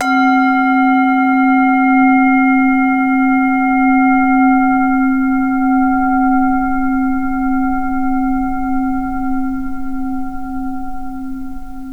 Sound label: zen prayer bowl